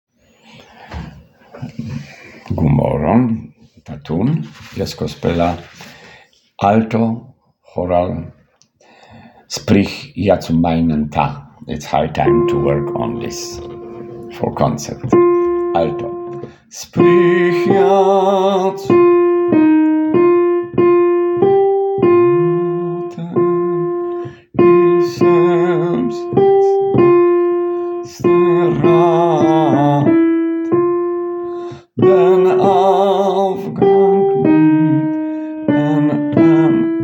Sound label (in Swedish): Choral alt